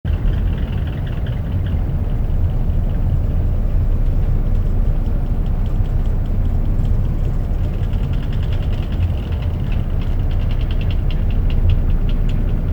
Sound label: Ship creaks from the hallway Southern Ocean; Ross Sea 2025; expedition blog calum turner